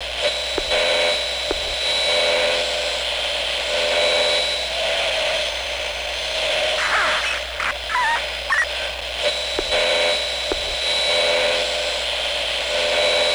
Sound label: radio interference; ambient; enviroment; ProjectMobius